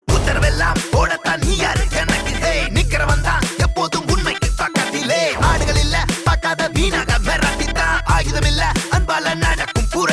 BGM Ringtone; Jai Bhim; Suriya; Tamil

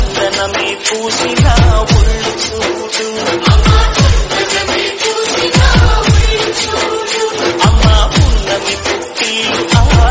Durgamma Devotional Song Telugu

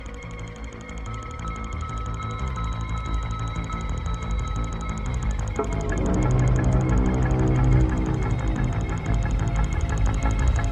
Disturbing BGM; Anjaam Pathiraa; Malayalam

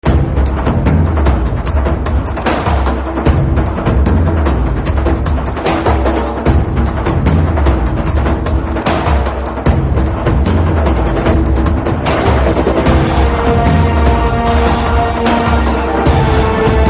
Drum Beats Ringtone; RRR; Hindi

